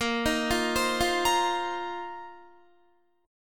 A sharp Suspended 2nd Suspended 4th A sharp, Guitar; 18,18,15,17,17,17; 4E,4A,5D,5G,5C,6F; arpeggiated